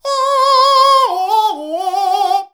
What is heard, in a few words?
"AAAOAOAH", SHOUTS; Best Service; Hyper Dance